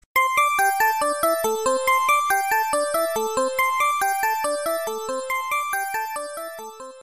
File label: Ringer